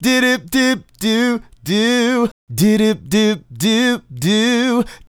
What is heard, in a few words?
Male Solo, Hip-Hop and RnB Vocals